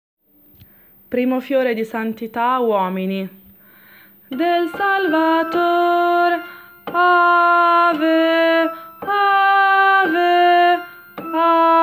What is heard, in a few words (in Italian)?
uomini